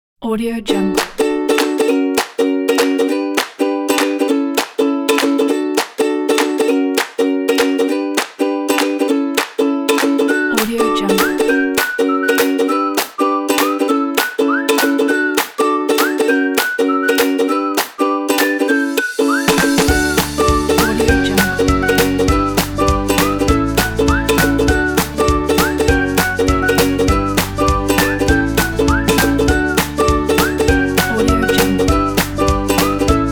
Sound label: upbeaat whistle